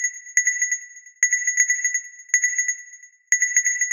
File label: 122 BPM Metal Glass; mmb drum arsenal vol 1